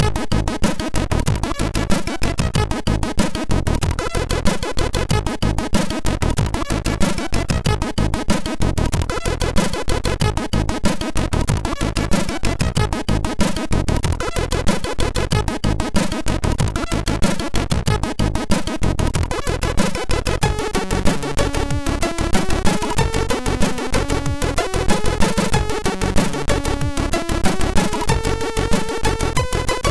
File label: sid MOS6581R2; No Name 4; hvsc